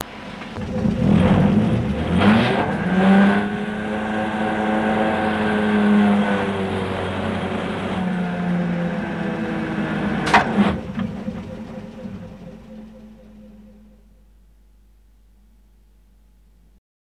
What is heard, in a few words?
3102 Wheelslip; Strathfield; The Sound of Legends Part 3